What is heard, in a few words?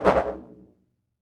SPIRIT CATCHER